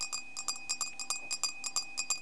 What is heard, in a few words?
bell